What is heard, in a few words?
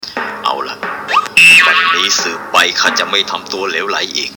cartoon